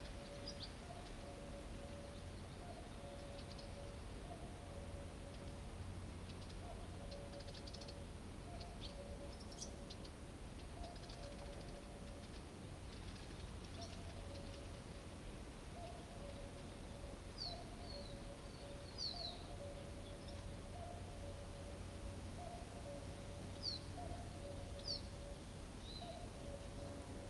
forest summer light wind cuckoo bees birds close by willow warbler stereo; sonniss gdc